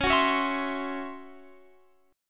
C sharp Minor Major 7th Double Flat 5th, Guitar, Open F; C#mM7bb5; strummed; x,20,18,20,19,19